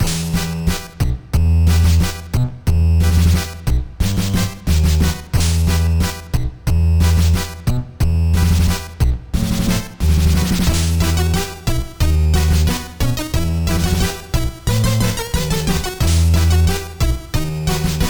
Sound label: music safehouse loop; Skellboy